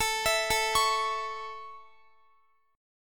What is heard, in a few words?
A Major A, Guitar; A arpeggiated; x,x,21,21,21,21